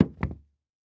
Foley Footstep Golf Cleats Single Step On Hollow Wood Surface; Foley Footsteps